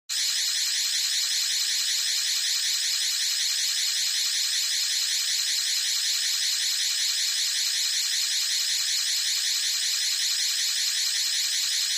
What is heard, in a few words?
Alarm Strobe Light